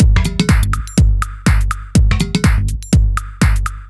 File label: G# 123bpm, House Drumloops; Zero-G House Fabrik Drumloops